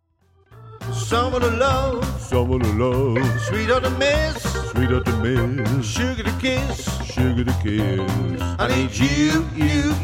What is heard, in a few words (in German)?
ohne ukulele